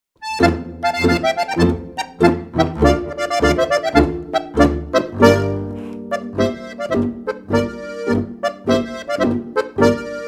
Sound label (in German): Marsch